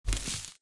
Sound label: imp bullet lightballistic dirt debris; Titanfall 2